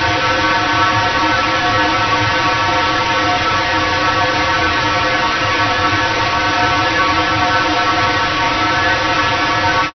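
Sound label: stationary noise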